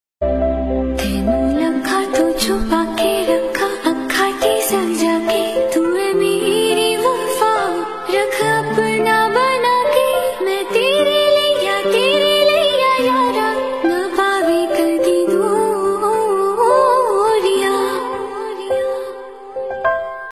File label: female ringtone, bollywood